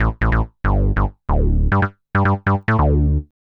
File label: SNTH BASS ELCTR 140 A, Techno